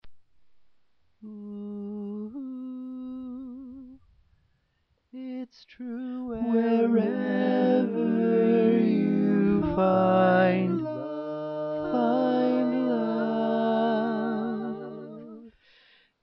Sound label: Bari, barbershop